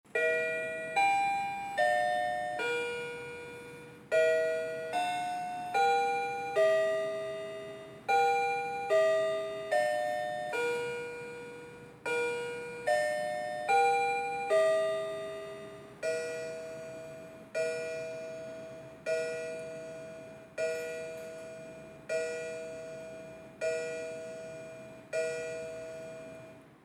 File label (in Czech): ukazka zvuku odbijeni